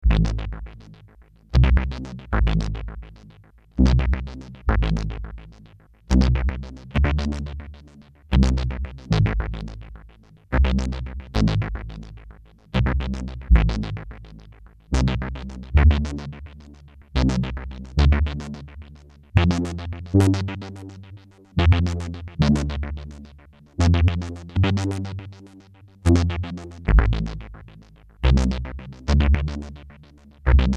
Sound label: lfo vcf